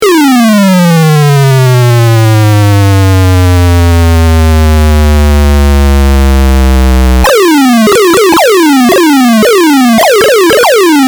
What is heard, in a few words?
bird lane